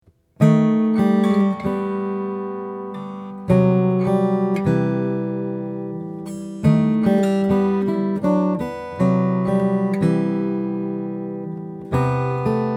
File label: hewett d brazilian rosewood